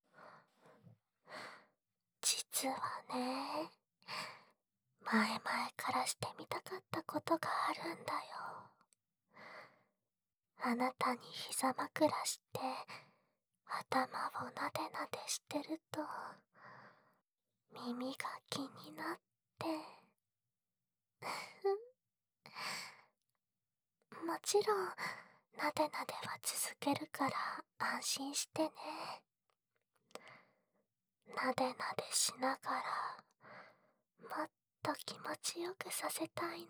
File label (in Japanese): 頭をナデナデしながら、優しい耳舐め（左耳）, ASMR; No SE